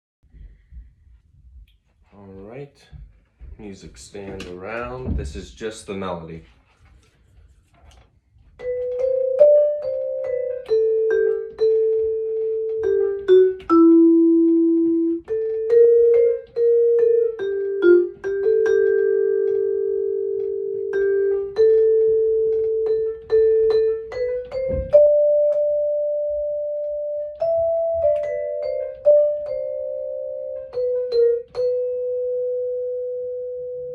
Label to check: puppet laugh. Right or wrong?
Wrong